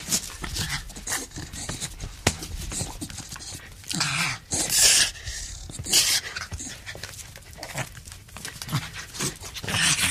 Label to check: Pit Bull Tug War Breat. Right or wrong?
Right